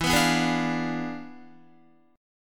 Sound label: E 7th Flat 9th, down, Guitar; E7b9; strummed